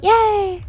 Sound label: yay